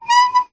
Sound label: foley wood balustrade turn squeak; zapsplat